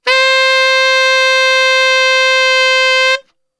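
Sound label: C 2X SAX STR, saxophone; Best Service; Brass Super Section; SAX STRGHT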